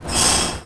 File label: Brake, Train; OpenBVE